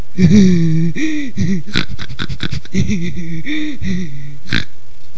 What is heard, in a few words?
snort; Quark RB; nerd